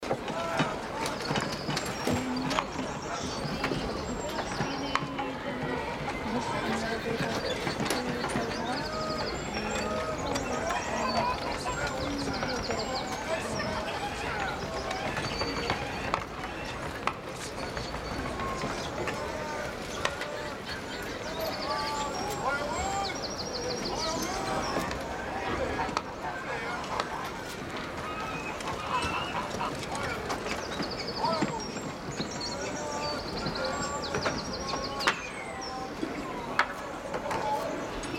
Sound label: market loop, ambiences; setessa; Michael Ghelfi